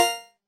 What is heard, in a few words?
confirm, ui menu; freepixel